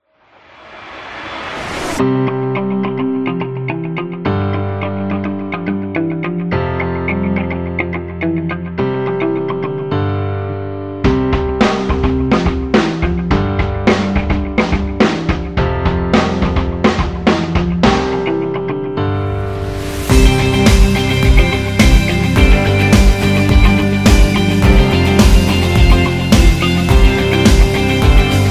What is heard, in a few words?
Corporate Pulse; corporate music vol 1